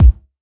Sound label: rich low end kick one shot b key, Royality free steel kick drum sound tuned to the B note